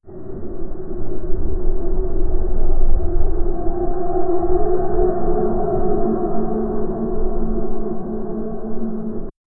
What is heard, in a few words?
an environment sound for, castle